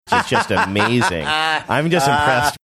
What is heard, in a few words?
having good laugh